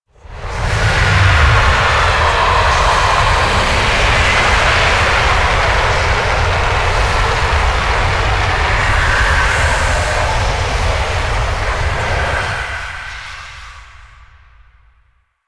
Liset Relay Intro Docking, Vehicles; Stalker Liset Skin; Flight

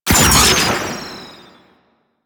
Proto Infested Mutalist Proj Fire; Warframe; Abilities

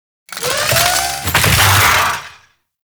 Melee Get Up; Warframe; Necramech

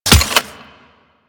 Crutch Step Dirt; Warframe; Grineer Ghouls; Ghoul Drill Sergeant; Foley; Footsteps

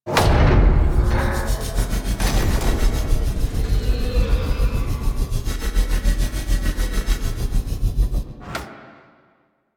Machine Thrum; Entrati Labs; Cavia Rank Up Scenes

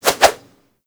Staff Swing Spin D; Warframe

